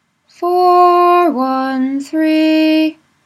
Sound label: weekday minor pausal, nusach